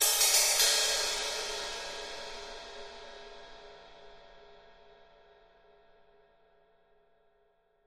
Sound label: Cymbal Accent, drums cymbal